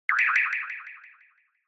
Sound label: Accent